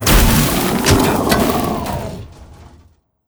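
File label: Boss temple guardian death, monster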